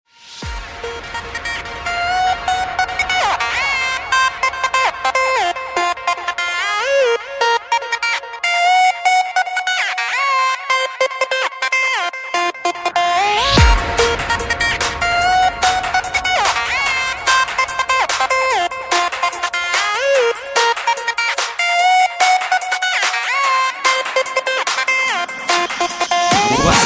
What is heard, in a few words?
Techno ringtone